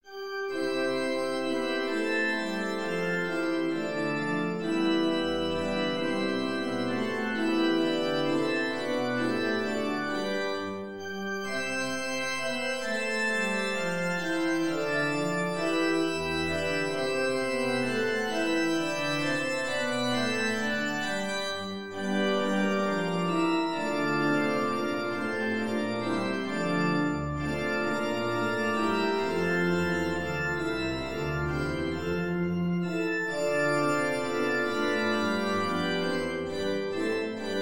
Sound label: Menuet gotique